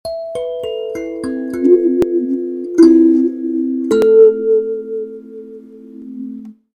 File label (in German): Sansula